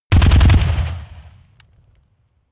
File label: rifle ak-47 exterior fire burst bass